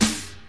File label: loud snare sample f key, snare tuned to the F note. Loudest frequency: 3525Hz; snare drum samples